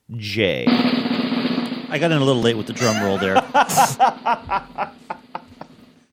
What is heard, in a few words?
late on drum roll